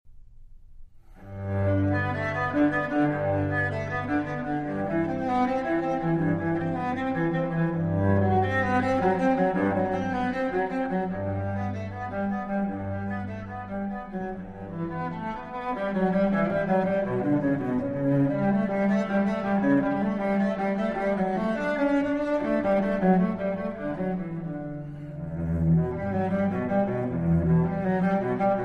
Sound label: Cello Suite In G Major Prelude; Cello Suite No1; BMV1007